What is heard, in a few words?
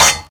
good open hat sample c key, Royality free open high-hat tuned to the C note